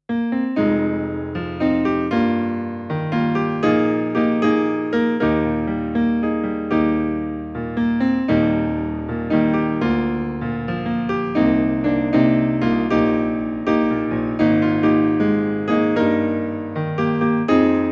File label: chorus piano backing